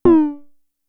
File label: Tom, Toms; Fricke Schlagzwerg; Drum Machines